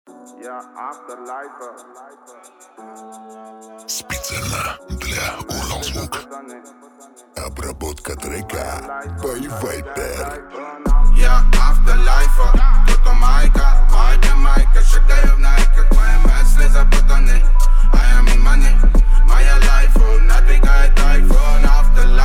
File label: 26-29hz Low Bass